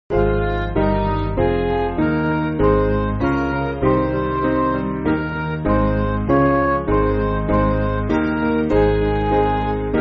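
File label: Midi, Music Backing Files for Churches; Gabriels Message; Angelus Emittitur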